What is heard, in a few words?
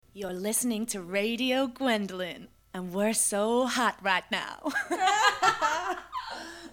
Jingle